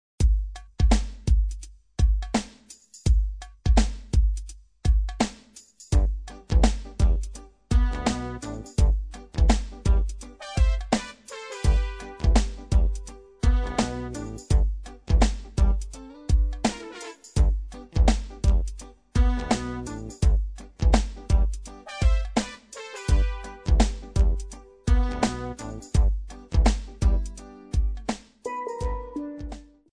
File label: steel pan sax